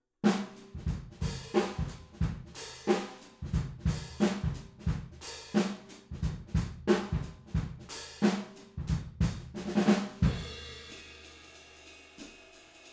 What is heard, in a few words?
Room